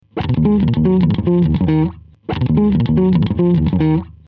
Bass dark compression

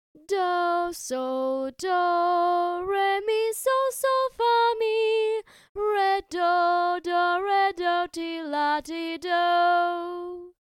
solfa melody

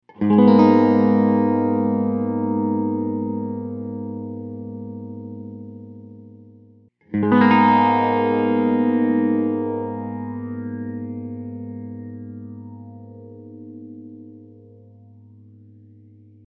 phaser

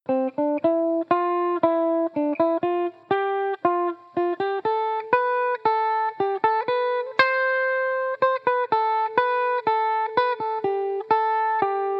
scale example; C Major playing pattern variation 1

